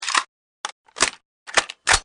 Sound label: M16 Load, Assault Rifle; Loading; Assault Rifles; Guns; VRSonic